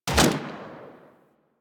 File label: Fire Shot, Weapons; Braton MK One Redux; Warframe